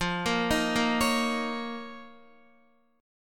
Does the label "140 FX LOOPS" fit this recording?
No